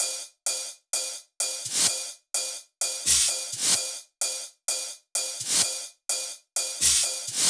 128BPM Machine Kit

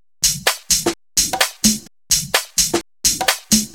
House loops 128BPM; Vengeance Samples

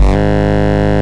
Root G#, Bass; Vengeance Samples

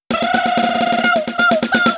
Fill 128 BPM; Vengeance Samples; VEH1 Loops

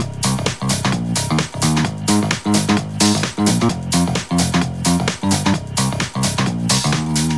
130BPM Melody; Vengeance Samples; Comboocha Kit

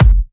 Soft Kicks; Vengeance Samples

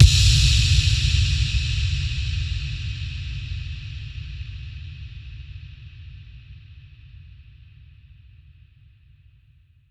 Hits & Explode

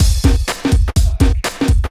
drm 125, drumloops percpattern; house; PS-28 Vocal House Samples

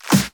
Pre-Shifted Claps; Vengeance Samples

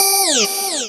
Synths G; Vengeance Samples

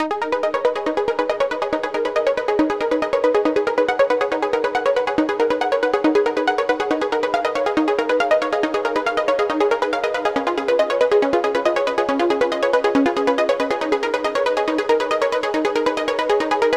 139BPM Synthline WET, G#minor

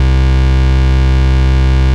Ultimate Bass Root C; Vengeance Samples

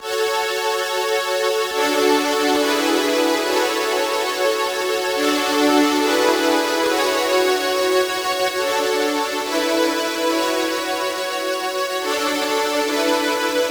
Synth EuroPad, 128 BPM; Rap; Vengeance Samples; Vocal-Hook Kits; Song Kit

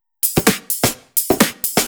Electro Loop 128BPM; Vengeance Samples

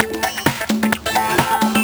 Root A 130 BPM; Vengeance Samples; VEH3 Special Sounds

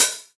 Cymbals OH, Open HH; Vengeance Samples

